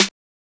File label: snare dirty souff; neuro; Sinistarr; Drums